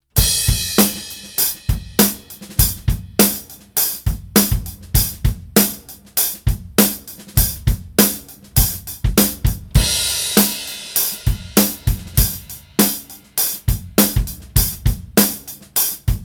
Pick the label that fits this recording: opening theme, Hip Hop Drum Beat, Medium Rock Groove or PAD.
Medium Rock Groove